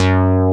SELECTED BASS; Masterbits; Dance Trax

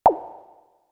Percs, Elektron MachineDrum; SPS1 MKII

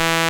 JX PULSE; E-MU Producer Series Vol. 2 – More Studio Essentials

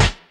KIT, Dance Mega Drums, HIP

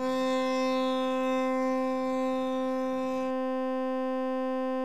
DBL BASS, Synclavier Strings; ILIO

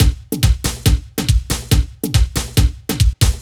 Addon Loop, Drum Loops 140bpm, Perc Loops

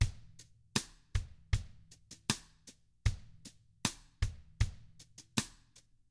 COUNTRY, Drums

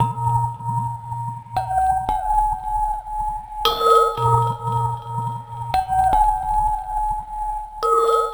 Otherworld Chime; Sonic Foundry (Sony Creative Software); Loop Noir; Session 12 - Otherworld Journey

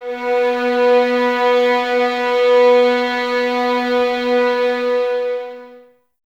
violins B 3, string ensembles; Miroslav Vitous; 11 Violins

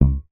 C#2, FINGER MUTE; Xsample VOL-1

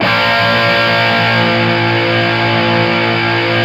B 3 SOLDAN, Guitars, SOLDANO ROCK; Spectrasonics; Hans Zimmer Guitars VOL-1

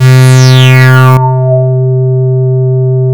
MUUH, TWAV BASS; Soundengine - CSR-000 The Art of Transwaves

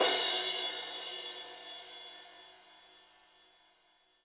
HiHat & Crash, Roland TD-20; HiHats

